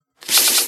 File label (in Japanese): syu syu syu